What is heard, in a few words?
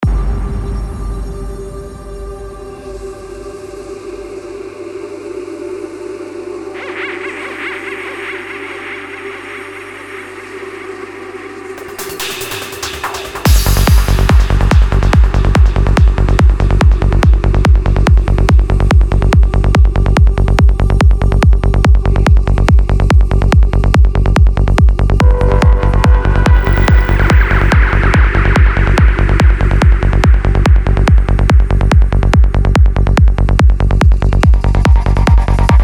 psy trance samples